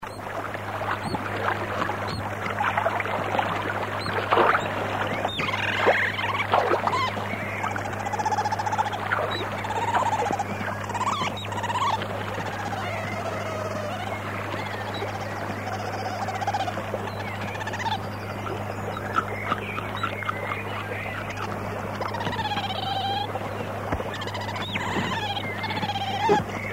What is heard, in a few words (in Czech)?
vydra